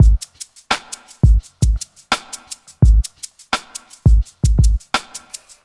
85 Drm Lp

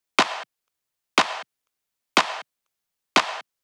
Drum 121bpm Clap

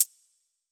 Hat Closer

